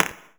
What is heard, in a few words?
percussion; Vengeance Samples